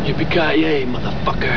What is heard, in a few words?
yippe